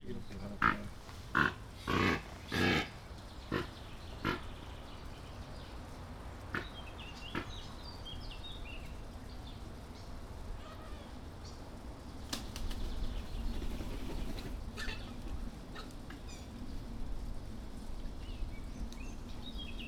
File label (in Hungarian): rózsás gödény szent íbisz; allathangok; veszpremizoo 2013